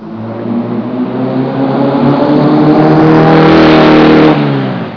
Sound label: car pass